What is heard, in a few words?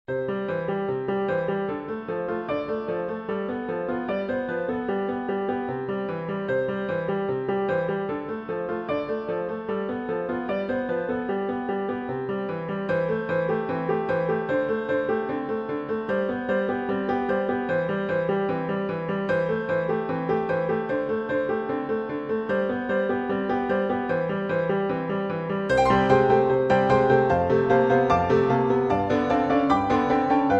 piano miniature